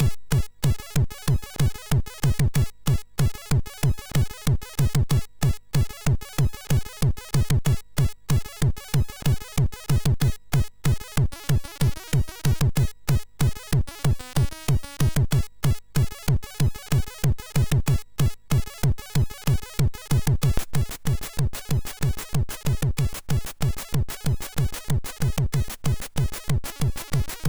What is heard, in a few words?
Hardcore Tekkno, MOS6581R2